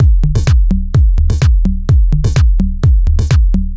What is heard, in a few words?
Swallow C# 127bpm, House Drumloops; 90 s Sample CDs; Zero-G House Fabrik Drumloops; ZG Drumloops